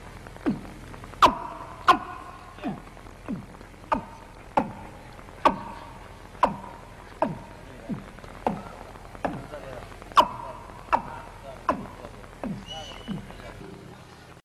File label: field bird